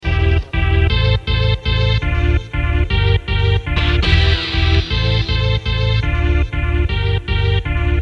Synth stab

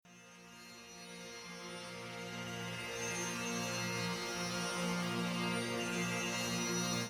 Sitar

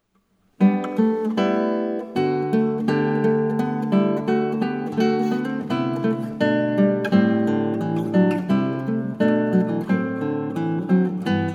Polonico Gaillarde; Tansman

